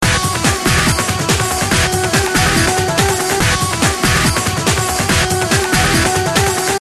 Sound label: Kitty Techno Loop